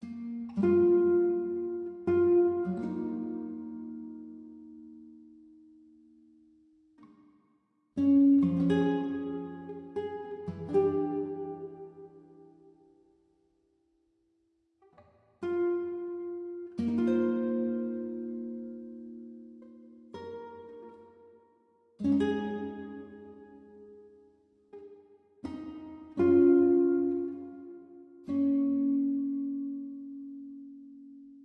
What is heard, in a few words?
Rain Cave guitar; Sky Children of the Light gamerip